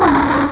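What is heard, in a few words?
car screech; CLASSIC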